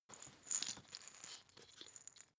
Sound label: glass pepper tilt; berufe; gastronomie; soundpool